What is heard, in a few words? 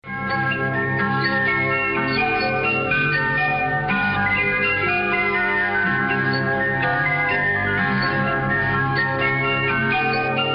jingle